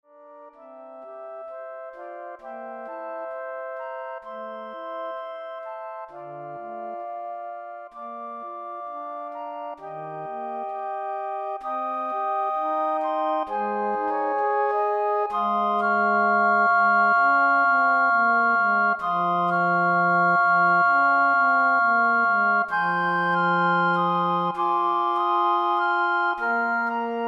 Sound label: Flute Ensemble